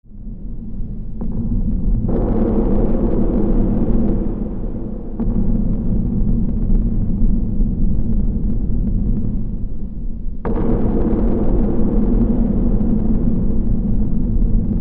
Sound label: WEAPONS